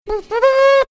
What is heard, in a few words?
making trumpet sound